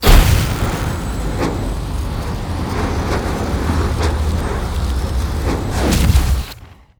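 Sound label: element concentration loop; elemental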